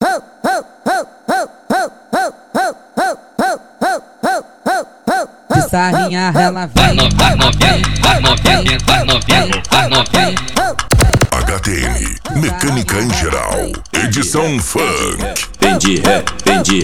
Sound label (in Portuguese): mecanica em geral edicao funk